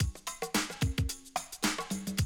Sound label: drum loop, 100 BPM